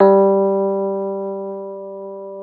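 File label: AMP FENDER G3, Keyboards Old School; USB Soundscan vol.09; AKAI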